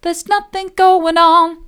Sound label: theres nothing going on soft, vocal